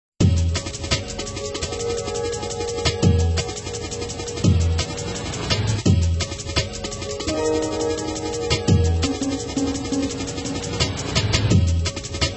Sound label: Drum and Bass Mix; Baku Baku